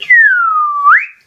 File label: Whistle